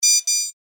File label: Beep Electronic, Scifi, Beep, Electronic, Science, Fiction; Chime; Tech Sound Effects; Blast Wave